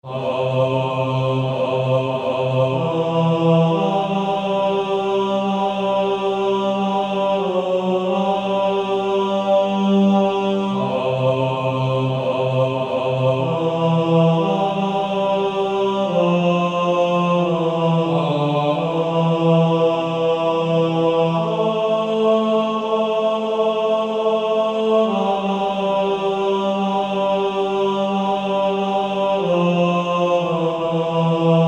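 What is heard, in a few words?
Male Choir, bass 2; More Love to Thee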